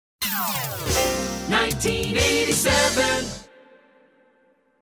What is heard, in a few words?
Hits, Drops